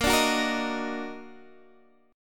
Eb Minor Major 7th Bb, chord

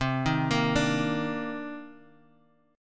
C Minor 9th C Guitar; Cm9 arpeggiated; JGuitar

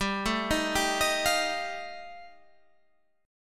G 7th Suspended 2nd Sharp 5th G chord

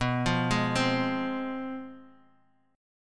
F Minor, B; Fm/B arpeggiated; JGuitar

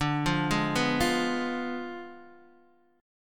D Diminished 7th D, Guitar, Open D

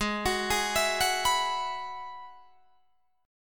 Ab Minor 7th Sharp 5th Ab, down, Guitar, Drop D

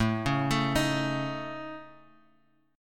A Major Flat 5th Guitar Open G

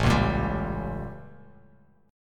A 7th Flat 9th A, Bass Standard; A7b9 strummed; JGuitar

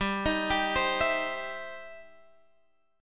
E Minor Major 7th Sharp 5th G

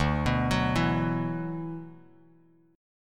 D 6th Add 9th D, Bass Standard; D6add9 arpeggiated; 10,14,16,9; JGuitar

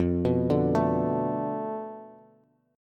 F 6th Flat 5th F down Bass, chord; F6b5 arpeggiated

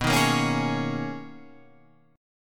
B Major 13th B Guitar Half Step Down

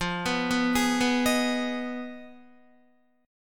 F Minor 7th Flat 5th F down Guitar; Fm7b5 arpeggiated

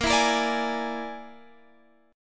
E 7th Flat 5th, Bb

